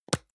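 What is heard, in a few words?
deodorant can lid open medium; haus-garten; badezimmer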